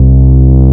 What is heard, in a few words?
MOOG BASS